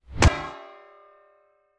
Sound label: metal shovel impact sfx leading woosh; attack battle